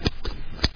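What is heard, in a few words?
large garden shears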